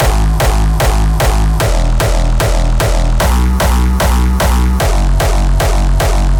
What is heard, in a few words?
Hardstyle Kit G#m 150bpm Kick; Drums; EDM Drums; Drum Kits; Stems; Ghosthack; Ultimate EDM Drums; Drum Mega Bundle; Hardstyle Kit 08